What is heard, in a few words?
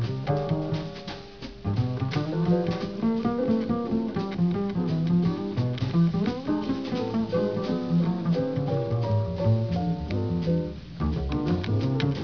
bass